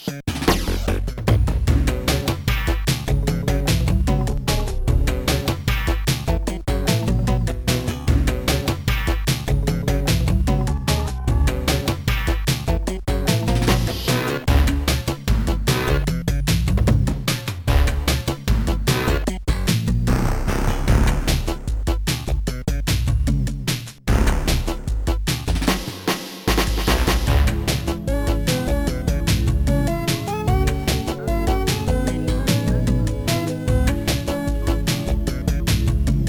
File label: mod slap string